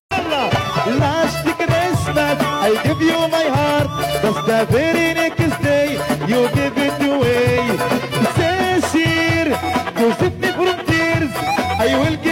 arabic

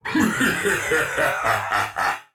he giggle

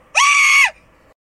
scream

